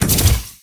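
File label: gold explo, attack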